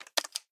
Lockbox Locked; The Town

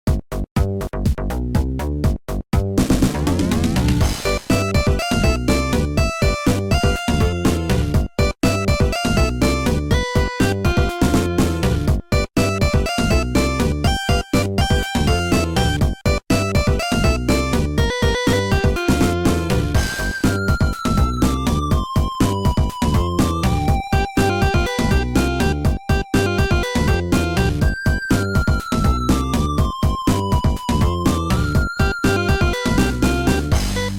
Slot Machine Bonus Stage; Sonic Triple Trouble 16-bit Remake

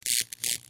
Cable; Cherricar

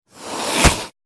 Scorpion Attack

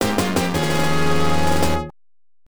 Item Chest; Landstalker The Treasures of King Nole; Genesis

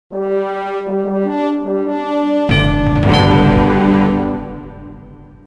Game Won

